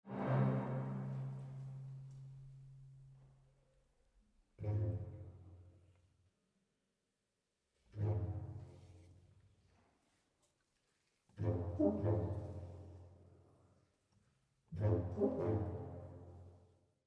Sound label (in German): audio restauration; createc